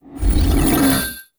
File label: Games Sub Menu In; Xbox bios